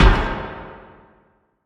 Drop Pot

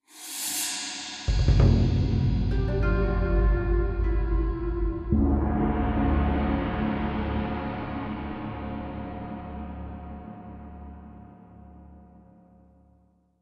Great Wall Front

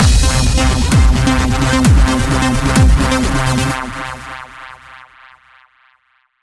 Action Boss; PunkGirl

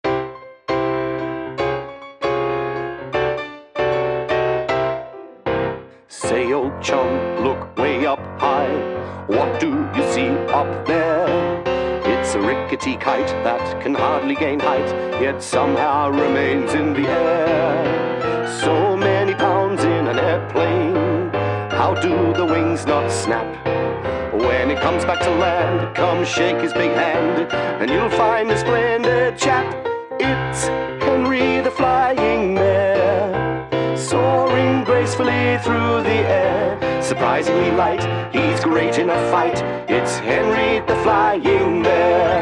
Song Flyingbear Vocal; baron fur is gonna fly; switch windows xbox one

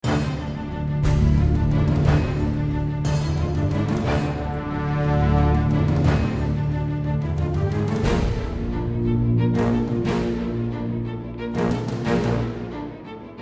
Action

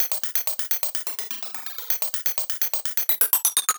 Mechanic Music Playing Dead Loop; Goddard

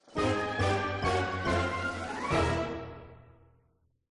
Kungfu Fight Lose; Minigame

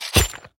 Knife Impact; Prison Architect

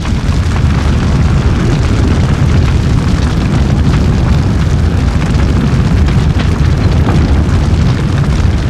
Fire Big; Prison Architect

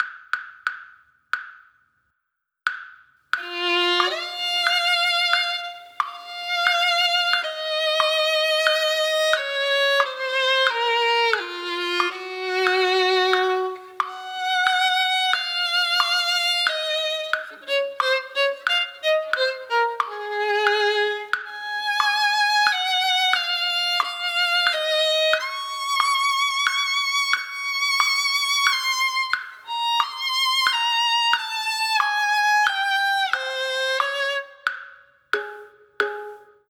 A Waltz; paper mario the thousand year door complete

